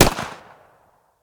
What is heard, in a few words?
Revolver Shot; SNKRX